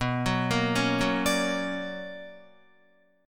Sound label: B Minor Major 9th, Guitar; BmM9 arpeggiated; JGuitar